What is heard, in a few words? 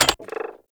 Arch Gun Alt Mech; Warframe; Archwing; Hildryn